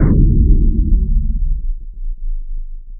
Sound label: underwater resounding thump short; explosion; wet